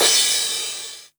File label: Crash